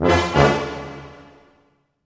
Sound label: brass accent; Orchestral Loops